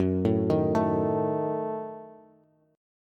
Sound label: Gb-Minor 6th, Bass; arpeggiated; JGuitar